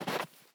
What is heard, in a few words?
snow jump